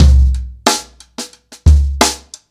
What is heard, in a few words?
120 bpm drum loop sample c key, kick tuned to the C note